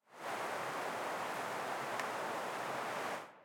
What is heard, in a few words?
Victory Wind; Smite; Susano